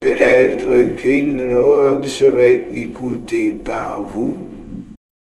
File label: jingle